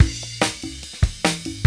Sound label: FAST TWO 146, loop, 122-146 BPM